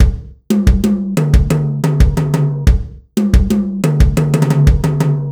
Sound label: Percussionist B, 90bpm; Сэмплы ударных (Легкая музыка); Drums; Easy Listening; loop; Ударные